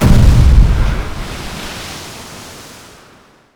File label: small water explosion; WAR